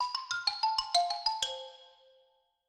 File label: grover says licks; Sesame Street Ready, Set, Grover